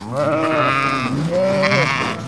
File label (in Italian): pecora, versi degli animali; animalandia